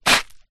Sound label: footstep scuff gravel dirt; foley